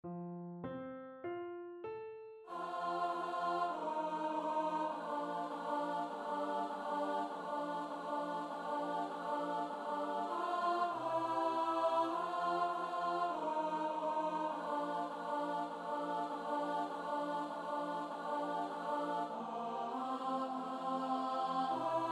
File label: ALTO STUDY